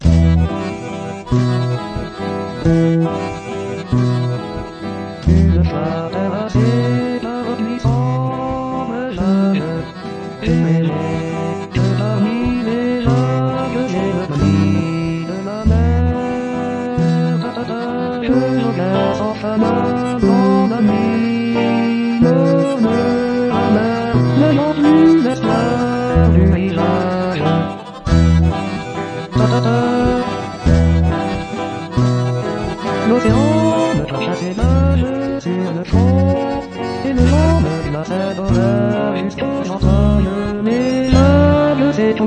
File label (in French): La vague et la cloche Chansons a texte